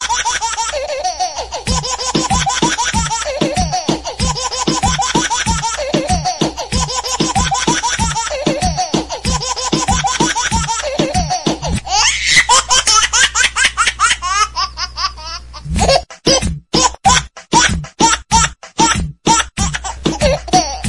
Funny Baby Laughing Remix

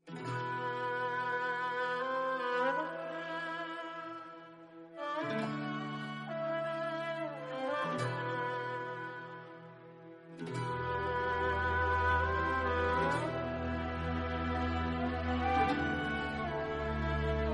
instrumental song

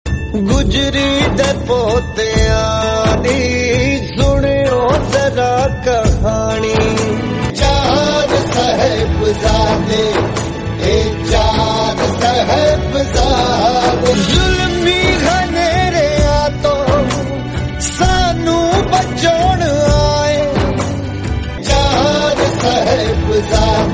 punjabi album; chaar sahibzaade

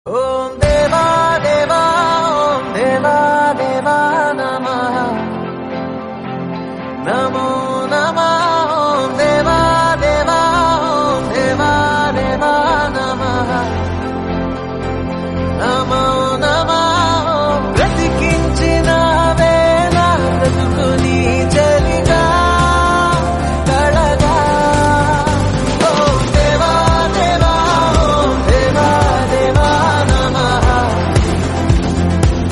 om deva deva deva deva telugu

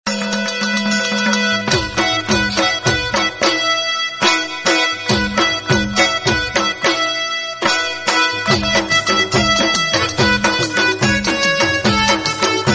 gujarati background music

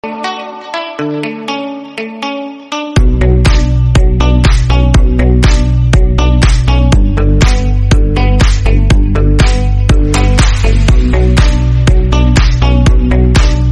instrumental

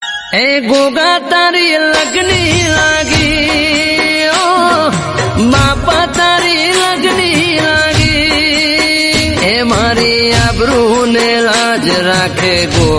gujarati song

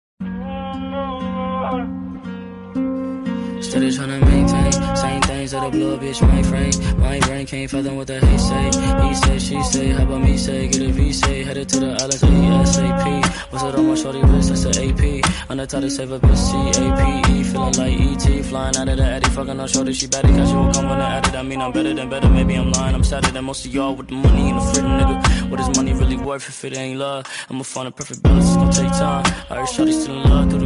sad broken heart english

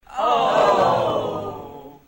crowd ohh

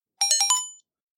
sms sound; gta 5

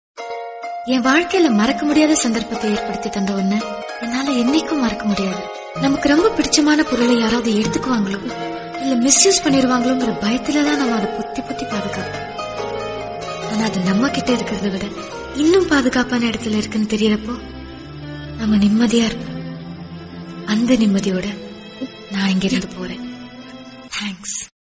bgm tamil; sillunu oru kadhal

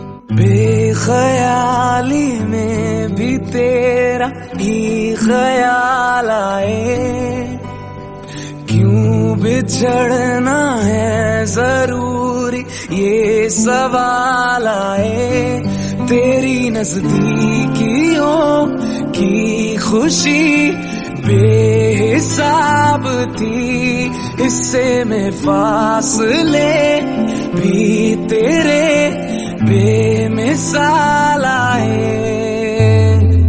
bgm; kabir singh

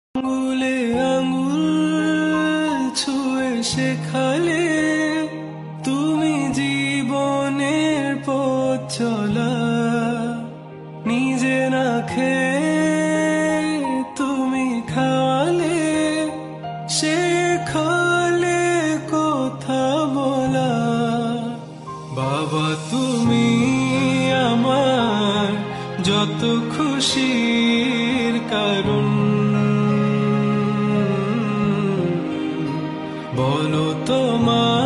bengali song

